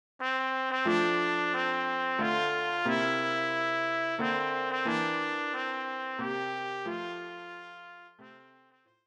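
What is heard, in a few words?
trumpet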